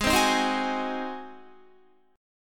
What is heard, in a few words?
Ab 7th Ab Guitar 4F,4A,5C,5G,5C,6E; 15,15,15,17,18,16; strummed